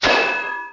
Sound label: step metal cap